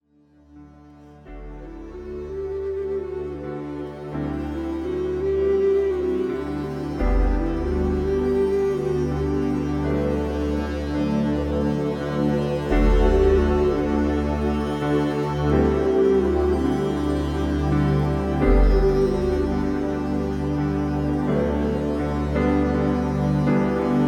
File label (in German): klangraum ausklang; klangraeume